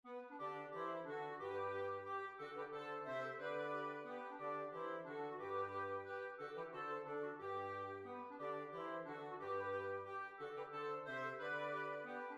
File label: Waltz